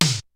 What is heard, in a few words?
KICK SNARE; Kid Nepro; Elite; KICKS SNARES